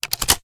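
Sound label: shotgun reload loop shell in; reloads; iw8; romeo870; gmod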